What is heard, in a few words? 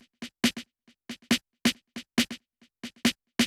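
808 snare drum, Techno 138 BPM; Best Service ProSamples vol.54; AKAI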